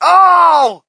crowd, shout; Mike Tyson Heavyweight Boxing

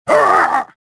choke

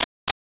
Tick Tock; Finding Nemo; XBOX

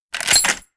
Lever Rifle Reload; Weapons

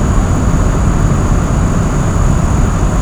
inside plane, ambience; Soldier Of Fortune II Double Helix; XBOX; airplane

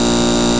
neon loop; Tony Hawk's Project 8; XBOX

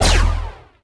rep canon fire tail; weapons

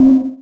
waypoint; Freestyle

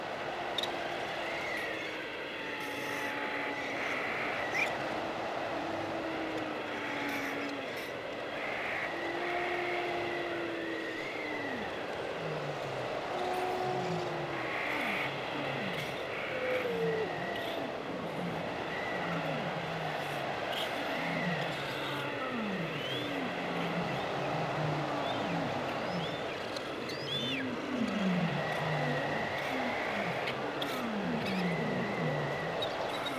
canadian wilderness; X2 Wolverine's Revenge; XBOX

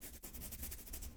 scratch; logo; Star Wars Obi Wan; XBOX

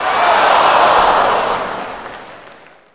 OOH; Grand Theft Auto Vice City; XBOX

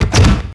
Wooden Desk Drawer Locked; Max Payne

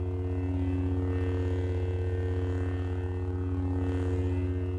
lightsaber hum; weapons

